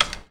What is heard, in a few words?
BUTTON; Best Service; Advanced Mega Effects